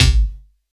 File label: BD, Drum & Bass, 160 BPM